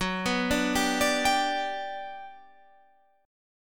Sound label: G Major F sharp, chord